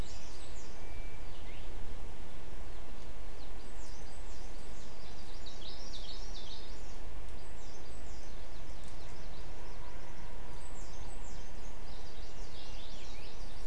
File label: mainly bird cluster